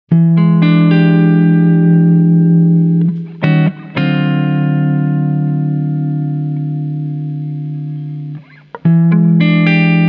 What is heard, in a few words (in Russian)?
Gibson Les Paul Чистый звук